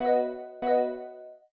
communicator ringing; Ringtones

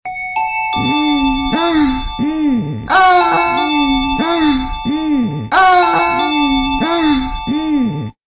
Tempting moans; Ringtones

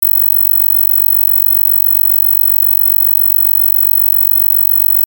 Unbearable Distorted

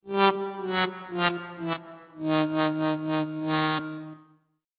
ringer; Ringtones

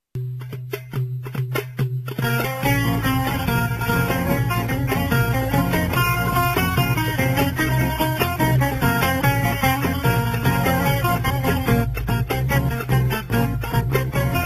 The Sand Dance Percussion

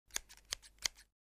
scissors

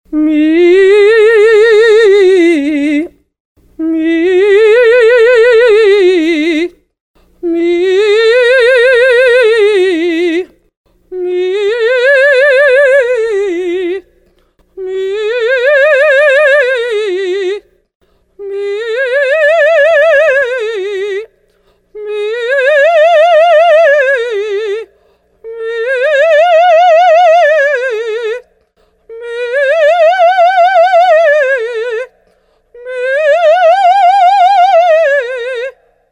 Warm Up To Singing

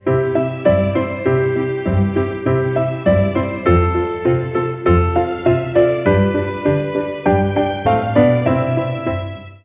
ringer; Ringtones

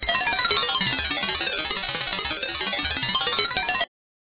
ringer; Ringtones

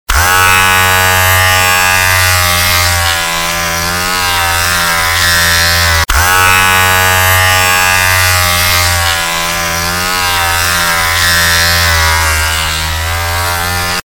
SHAVER

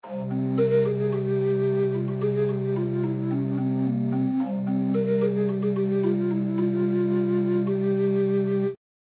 ringer; Ringtones

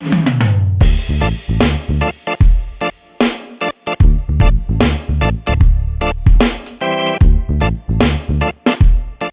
ringer; Ringtones

